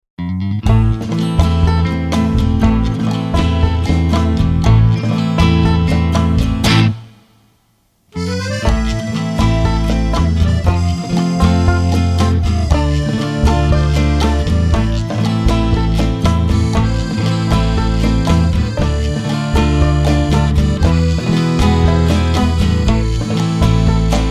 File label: Western Tango